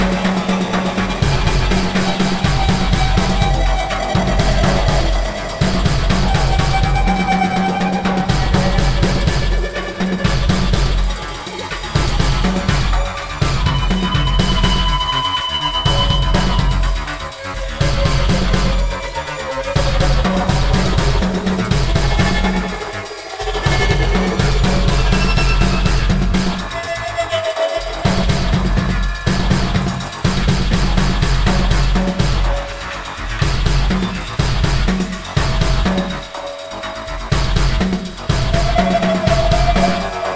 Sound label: pulse